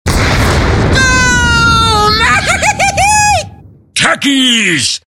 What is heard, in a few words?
lose; techies